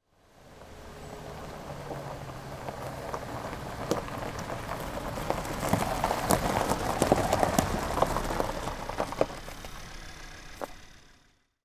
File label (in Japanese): jarimichi ni kuruma ga tomaru; Chino Cultural Complex